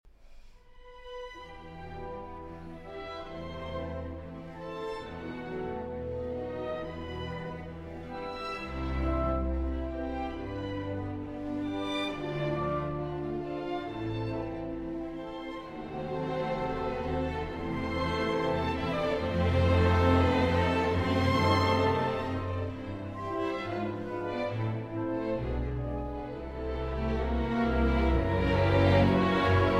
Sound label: Symphony in E Minor, Allegro; Symphony No. 4; Op. 98; Brahms; I. Allegro Non Troppo